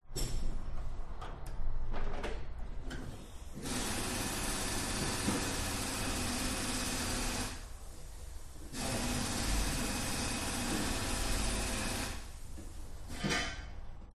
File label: powerdrill screwing wheel bolts quick movements air release metal clunks; garage; Sound Ex Machina